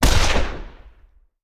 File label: Rifle; Sound Ideas; Guns